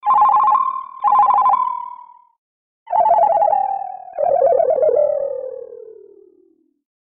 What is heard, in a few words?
Dying Ring